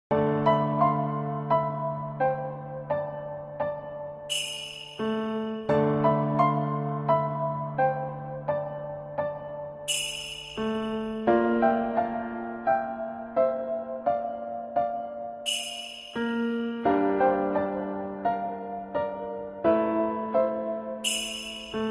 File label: Sad Theme